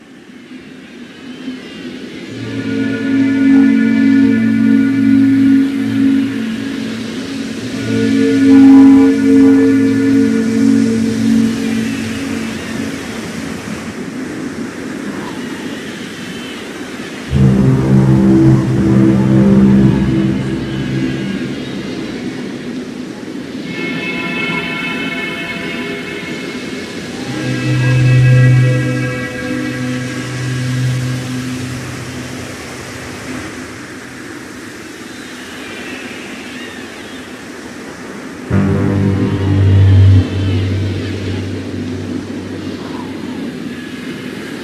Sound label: Freighter Wind Bells; besiege macos windows gamerip 2020